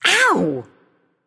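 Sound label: ouch, female; smite; voicepack; hera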